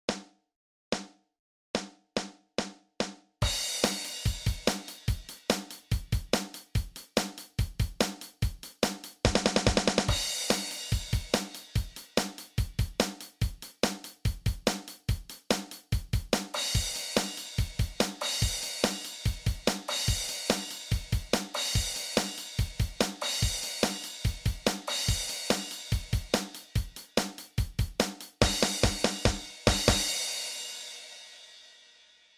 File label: Drumset